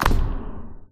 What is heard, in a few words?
deconstruct